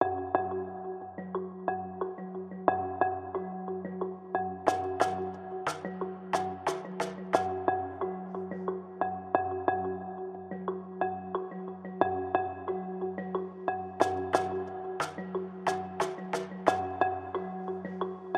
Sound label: Marimba and Ocean Drums Only